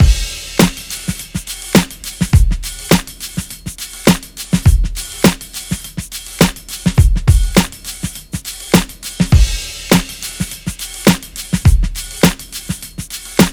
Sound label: 103 Disco Funky Electro, Breaks; Lockdown Breaks